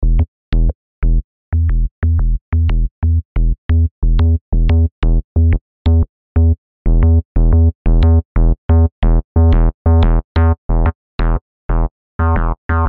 Omnisphere Seq Juno Sam

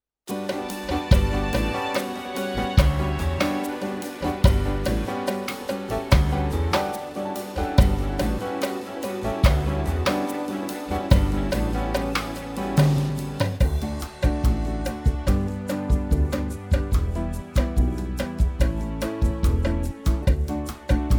Yamaha S970 Style

